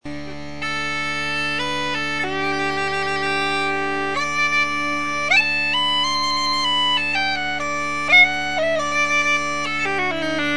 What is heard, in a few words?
drones, Uilleann Pipes; narrow bore; flat pitch